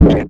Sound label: Flash Pop Up; Multimedia Internet CD-Rom Flash